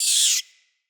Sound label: Deco Rat Squeak Angry B; Ambient; Vocals; Verminia; Animals